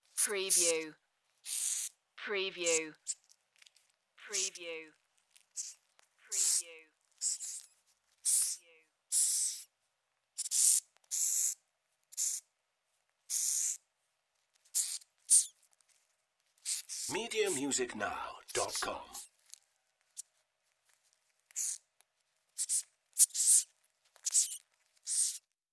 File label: Hissing Cockroach